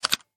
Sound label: multimedia button click; swipe it